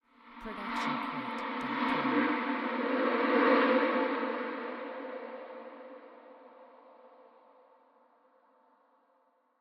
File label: Dark Magic Voices; SoundsCrate